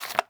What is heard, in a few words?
water bottle remove cap; Rust